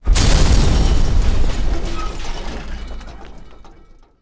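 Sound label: explode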